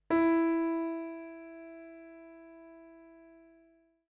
tonic

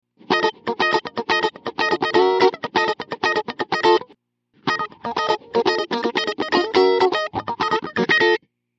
phaser

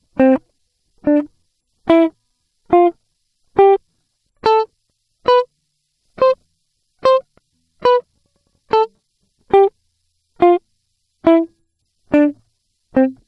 scale example

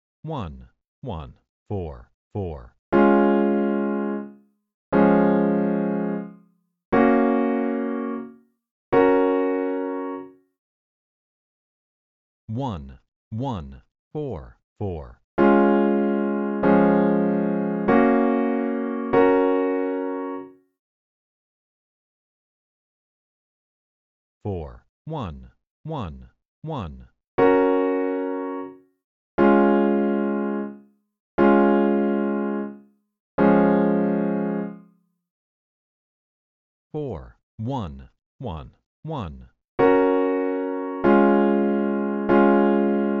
I IV Hard; Chord Progression Recognition; Training Exercise

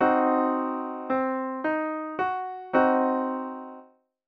C diminished arpeggio

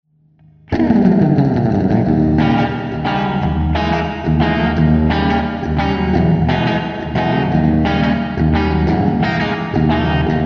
Spring Reverb

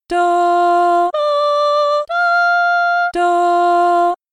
medium melody solfa; Sung

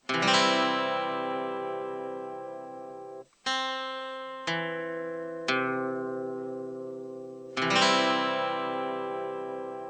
c major; Major Chord

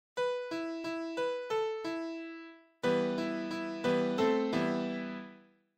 chords melody chords; B – E – E – B – A – E melody with iii – IV – iii chords

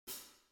hat pedal tight

